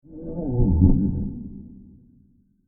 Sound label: Creepy Monster Growl Transition; Scary Soundscape; Horror; Crafted Signals